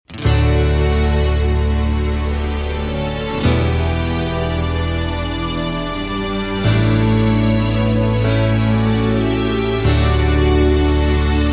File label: (D) no lead guitar